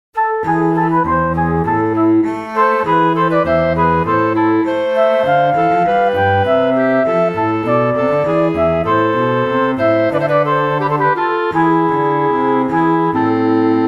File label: trio sonata minor for flute clarinet basso continuo; aubert francois